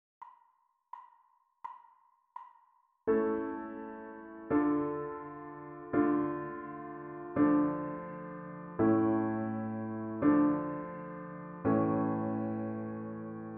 harmonic dictation; ear training

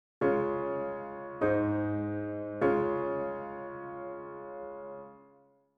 Db maj PAC; melodic dictation

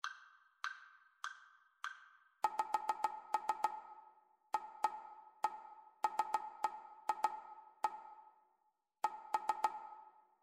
rhythmic dictation; ear training

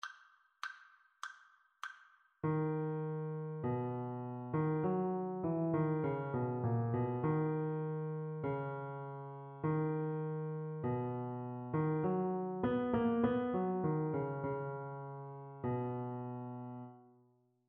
partially completed melodic dictation

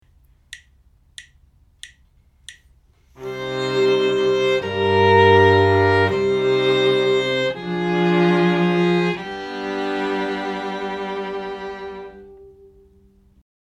harmonic error detection; ear training

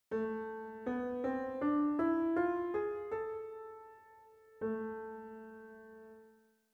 A min scale

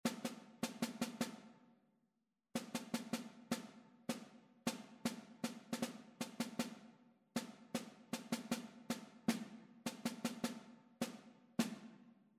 rhythmic error detection

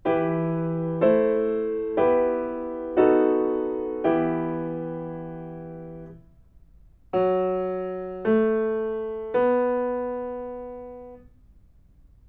melodic fragments; ear training

